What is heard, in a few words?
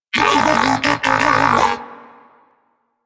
Moa Pet Cold Shiver; Warframe; Pets; Tenacious